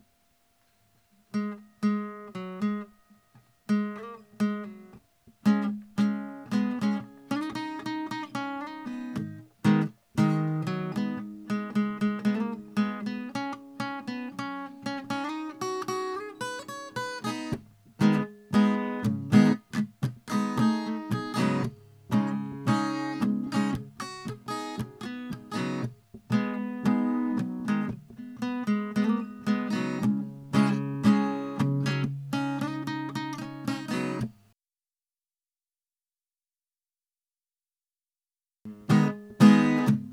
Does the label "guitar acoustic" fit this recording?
Yes